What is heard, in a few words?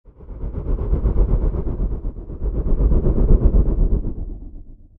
hallow, ambient